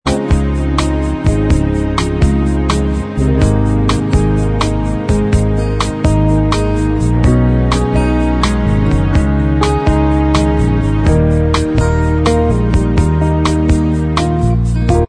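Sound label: bossa version Instrumental Track, backing tracks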